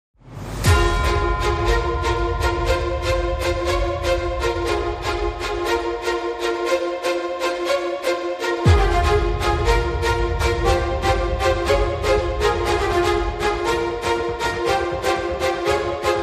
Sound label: No Synth